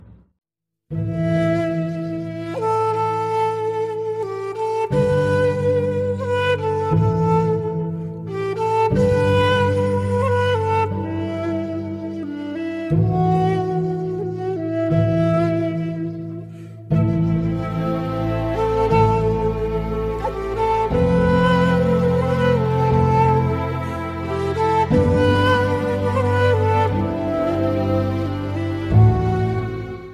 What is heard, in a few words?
Love Music Tune; Ertugrul Halime